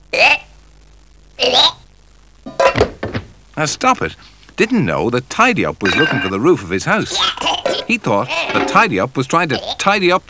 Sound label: teapot; classictv; stoppitandtidyup